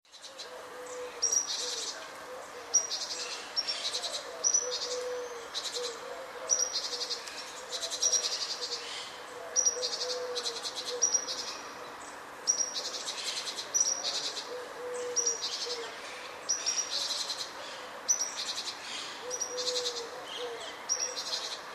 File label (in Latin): Parus major; SETE